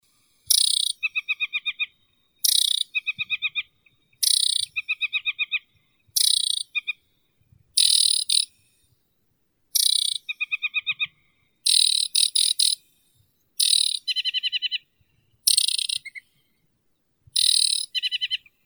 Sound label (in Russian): kamishovka vertlyavaya acrocephalus paludicola